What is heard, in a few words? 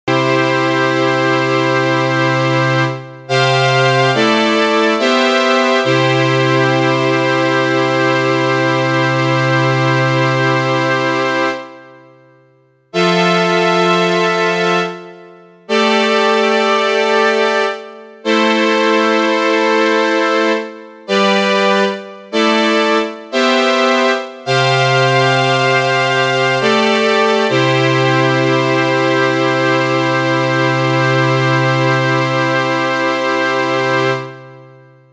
STRING